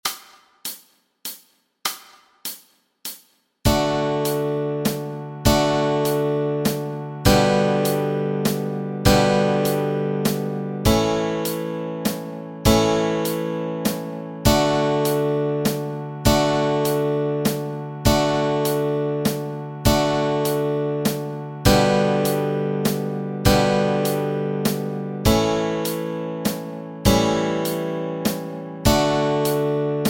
The Old Rugged Cross chords, Easy Guitar and Ukulele